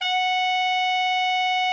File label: TENOR, Sax; Giga Samples Collection